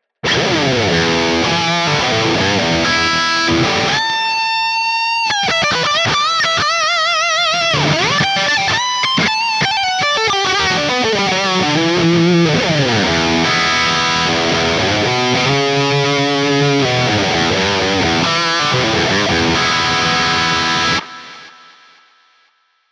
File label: lead solo; Ampero